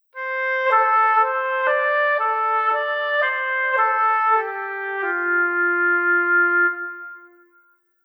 hoboj

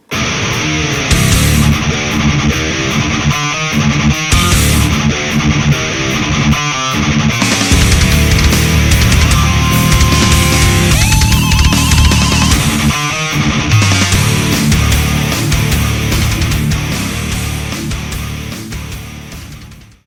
elektricka gitara

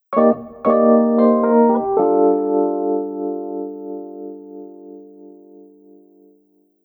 elektricky klavir

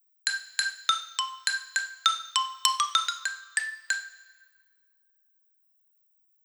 xylofon

